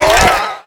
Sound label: NPC Killed